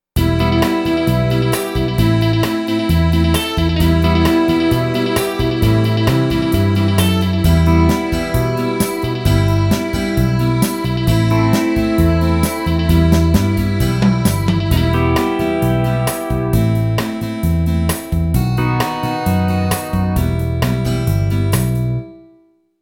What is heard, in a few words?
Rock Rhythm in Em Fast Country 132